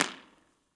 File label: Pacific Hall, Impulse Response; university of california san diego